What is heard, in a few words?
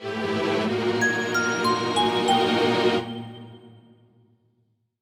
JINGLE GAMEOVER; the magic nightmare; android ios mobile gamerip; WHITE JINGLE